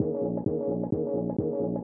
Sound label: 130 climax disco, sampled music loop; MELODIC LOOPS